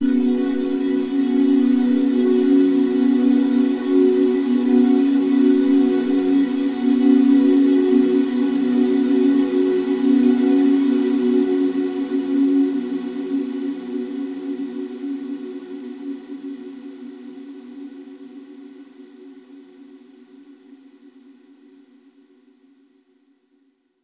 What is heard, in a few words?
Overlay Drone; Act2 Anchor; Mirror's Edge Catalyst